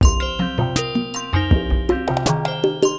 DRUM LOOP ambient 160

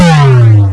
OOOAaaahhhhhmmmm; Burning Rubber 1.2.3

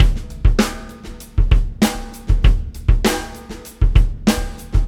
ProgRock 99bpm, Drums; ACID MUSIC

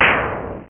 Spell Launch; Realmz 6.1.3; The Family Jewels

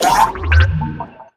incubator birth; darwinia demo

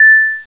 Info; だるま 1.2.3

